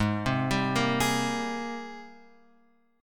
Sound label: G sharp Major 9th G sharp, Guitar Open F; G#M9 arpeggiated; JGuitar